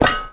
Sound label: Metal footstep